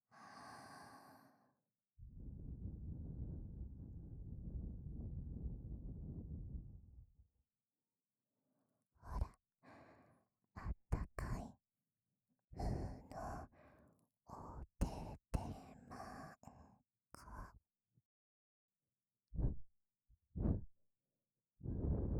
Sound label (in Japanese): しこしこぐにぐに; おまけ右耳用